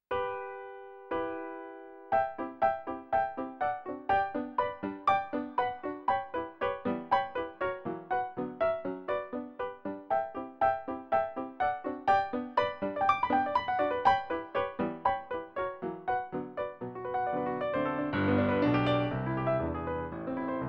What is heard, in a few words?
piano; zigeunerlieder 6; Brahms